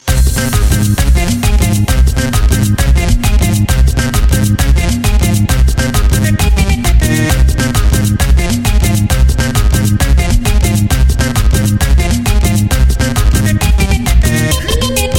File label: sudafrica tribal mix; latino